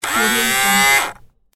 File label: robotic mechanism moving